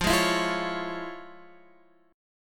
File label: F Minor Major 7th Sharp 5th, Guitar Half Step Down, chord; FmM7#5